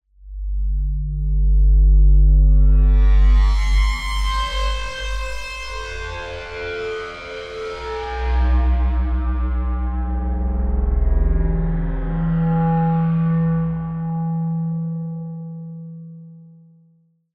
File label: Pad, Sylenth1; Routed Sound; Sylenth1 Expansion - Doom Carpenter Vol.1